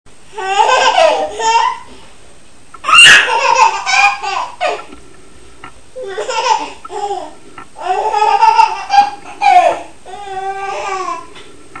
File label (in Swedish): skrattar